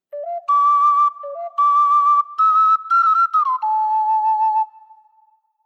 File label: Ocarina